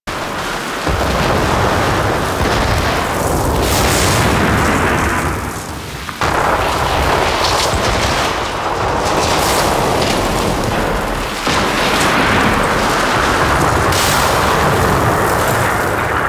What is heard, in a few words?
Destroyed State Amb; Warframe; Corpus Gas City; Boss Arena